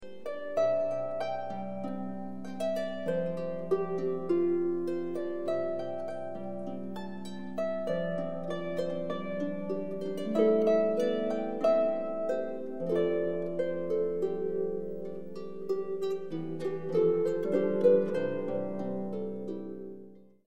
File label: harp impro